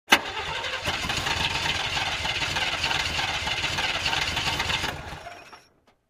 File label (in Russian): Avto ne zavoditsia